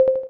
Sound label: xylophone; Samsung SGH-i310; Dialer Sounds